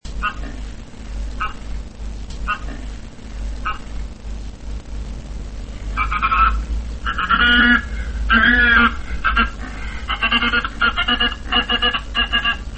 zdenerwowany kapodziób

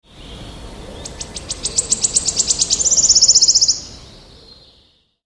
świstunka, piosenka prawdziwej świstunki leśnej; Pojedyncza typowa piosenka (perlisty tryl) składa się z fazy wstępnej i zakończona jest trylem.; wroblowe; zapozyczenia

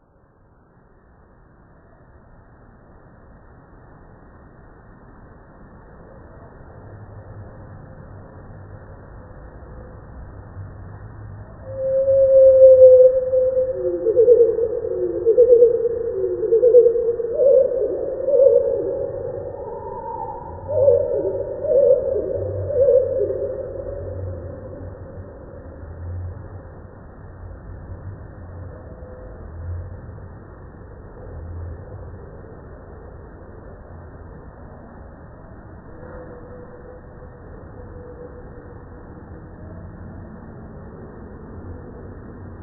pleszka, 8-krotne zwolnienie śpiewu pleszki; wroblowe; zapozyczenia

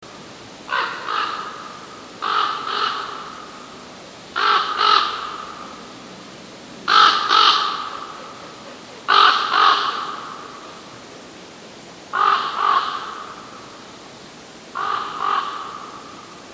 kruk; Przelatujący kruk; Kruk jest największym i najcięższym ptakiem śpiewającym

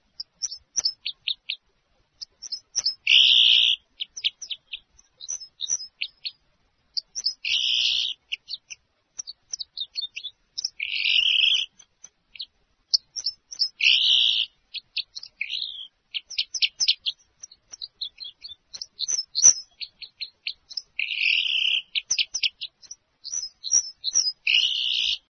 krzyżodziób świerkowy

